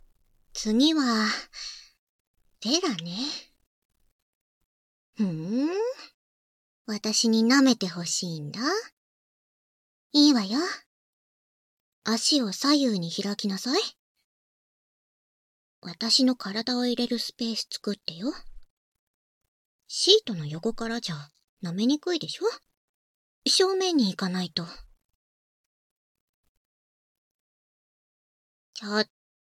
フェラチオ

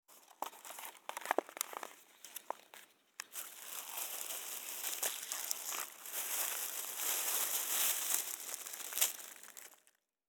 花火の厚紙をビニール袋に捨てる; 夏実と縁側花火デート

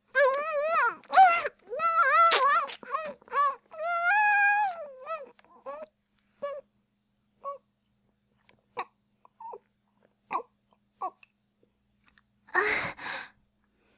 ごっくん, ボーイッシュ系音声素材; Honey Drop

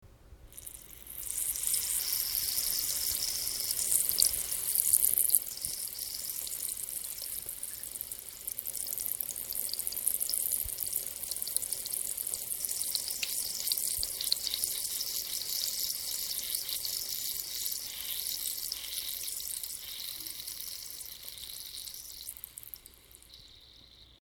しょしょしょーっおしっこ

